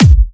Dubstep Kicks

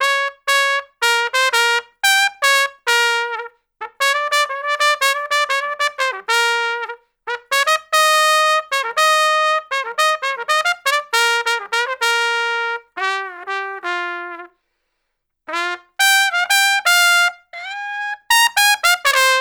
Trumpet Solo, Jazz; Traditional; Sonic Foundry (Sony Creative Software); Jazz Solos and Sections

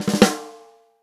ROCK SNARE CHROMATIC, Real Drum Kits

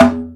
Conga Rim Low, Drum Machines; EMU Planet-Phatt

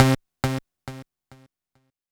C3, SynthLine

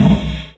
Loop, Chamberlin Rhythmate; 300 Drum Machines; Processed

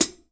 HH 12 INCH; AKAI S-Series CD-ROM Sound Library VOL-3

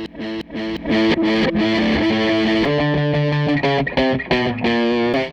Les Guitar Loop

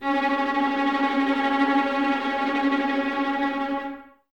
Violas Tremolo C#3, String Ensembles; Miroslav Vitous

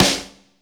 SN, Casio CTK-5000

